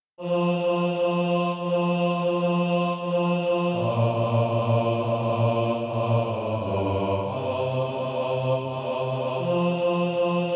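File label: bass, Practise Music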